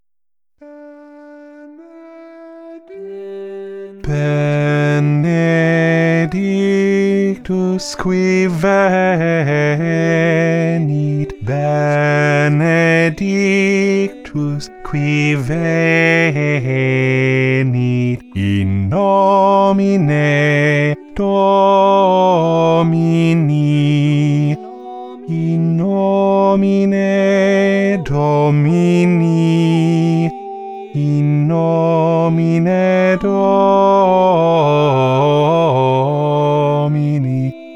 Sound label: BASS